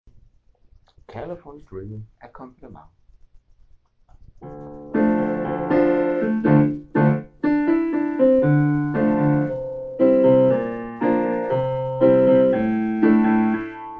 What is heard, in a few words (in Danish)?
akkompagnement